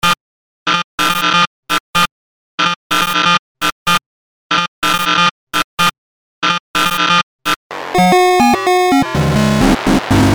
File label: Circuit Bent Loops